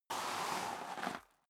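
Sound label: step boots gravel light slide long, footsteps; schritte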